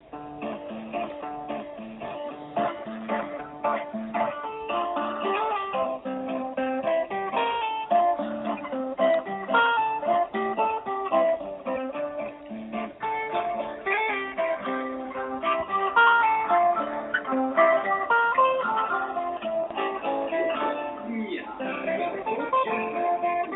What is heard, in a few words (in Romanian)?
muzica